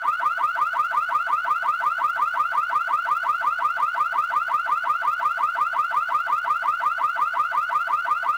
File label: Alarm, Ambience; Project Gotham Racing 2